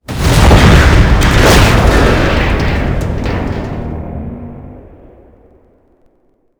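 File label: tank explosion large; WAR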